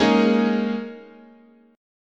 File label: Ab Suspended 2nd Suspended 4th, chord, guitar